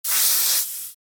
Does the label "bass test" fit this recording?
No